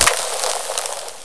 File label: puff, hornet; XDM